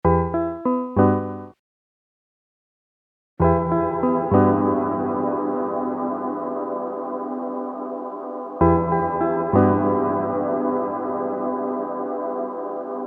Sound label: hall, casio mt520; BigSky